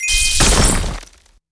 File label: Boom; Inotia 3; Wizard